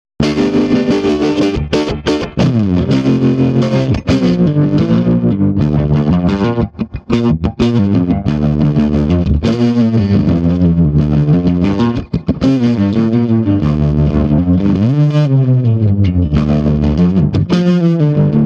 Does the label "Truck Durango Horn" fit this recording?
No